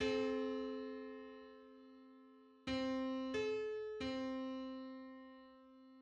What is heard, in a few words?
Thirty ninth subharmonic on C